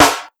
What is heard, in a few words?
long steel snare drum sample a sharp key; Duration 0:01